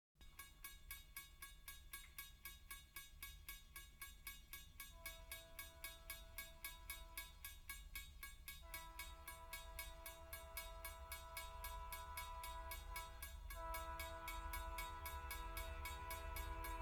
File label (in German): zug bahnschranke passierend - alarmglocke; Bahnhof